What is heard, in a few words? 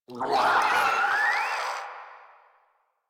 Infested Crawler Death; Warframe